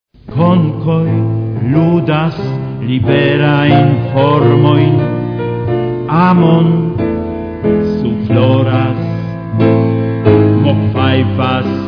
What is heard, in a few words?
Piano